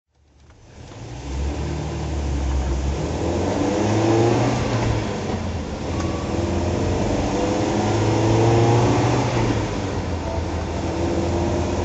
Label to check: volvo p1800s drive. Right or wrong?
Right